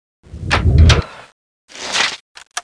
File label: Laser Lab Sound; Zap!; EdMark Corporation